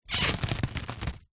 household cling film wrap stretched hand fingers rub across